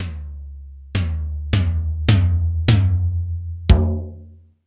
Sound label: Toms, E-MU Pro-Cussion